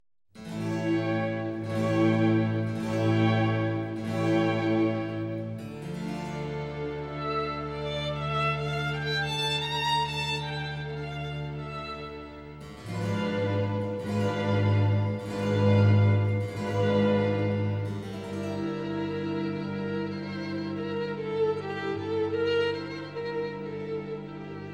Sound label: Concerto For Two Trumpets In C; Mvt. 2. Largo